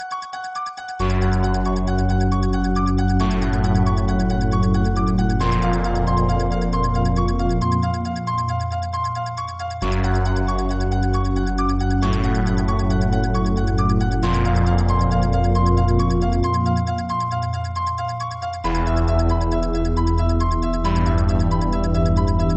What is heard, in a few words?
background music theme tone ringtone; iphone